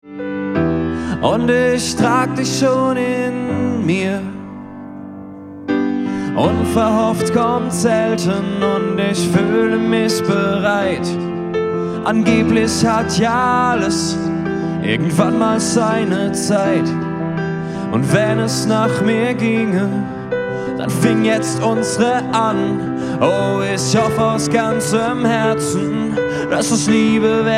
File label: piano